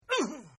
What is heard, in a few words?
Grunt; Partners In Rhyme